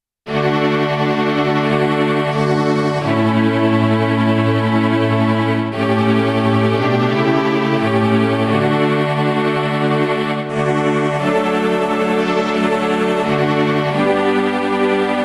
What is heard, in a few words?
midi